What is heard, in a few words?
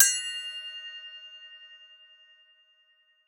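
Cup Chime; Roland SPD-S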